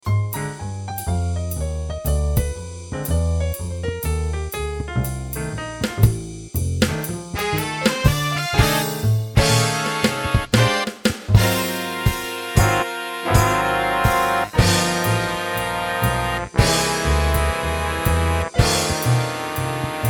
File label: Jazz XV5050